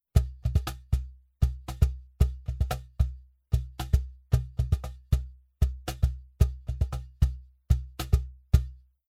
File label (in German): Cajon Groove Sennheisser MD421 Pitch Control zu mittig im Cajon unbearbeitet